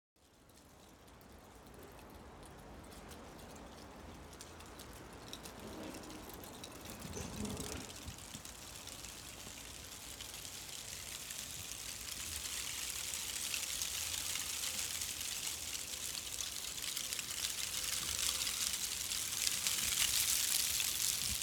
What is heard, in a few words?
skittering leaves